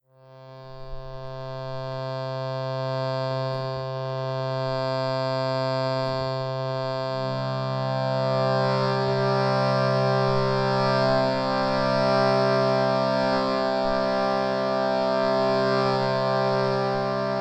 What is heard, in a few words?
shrutibox c